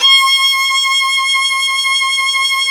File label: Synclavier Strings; ILIO